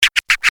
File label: scratch; camp rock back to school